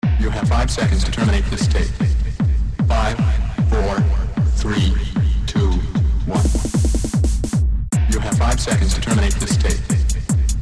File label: Techno Party